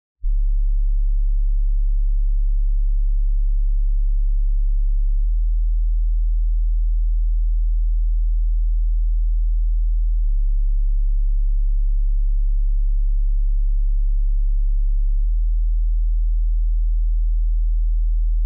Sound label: sines 35 40